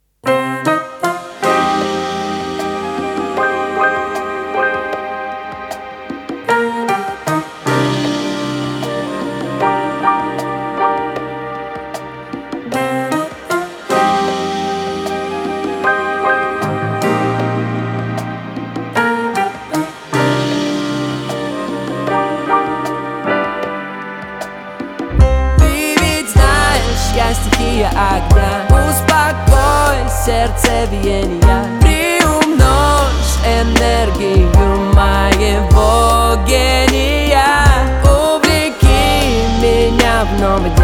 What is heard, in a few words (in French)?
Jazz douce musique d ambiance Deep Relax